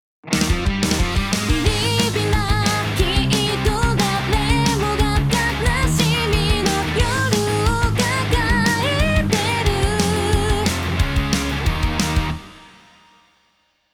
stereo delay off

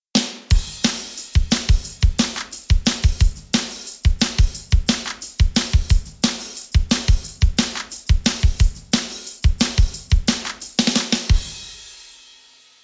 drum On

